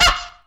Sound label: squeak, percussion; Misc Percussion